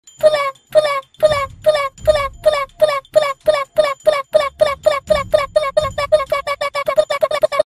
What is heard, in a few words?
Barfing Meme Sound Effect; Rocky